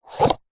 household cup plastic pull from stack; zapsplat

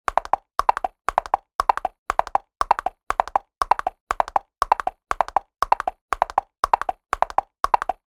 animals horse gallop hard ground coconut shells

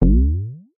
cartoon twang deep rising; zapsplat

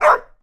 animals dog bark springer spaniel; sound effects one

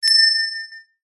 bell small ring close clean shop door bell

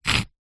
stone small single scrape on rock or brick; foley

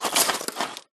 foley charcoal pieces movement in metal fire pit; zapsplat

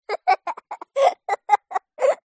child boy 6 years laugh short; zapsplat

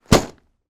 parcel down floor containing plastic items; foley

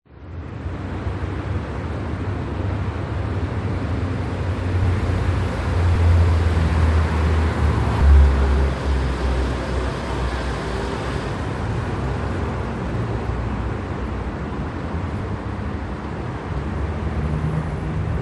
Germany Traffic; audio hero

